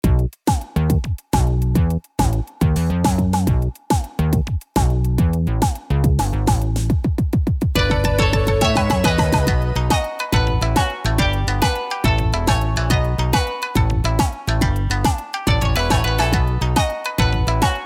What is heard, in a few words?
Jingle Dress; Taiko no Tatsujin Drum Session; Session de Dodon ga Don; PS4